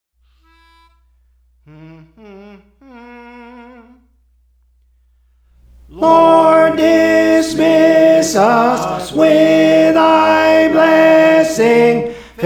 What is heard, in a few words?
Tenor; Praise For The Lord Training; Lord Dismiss Us; SICILIAN MARINERS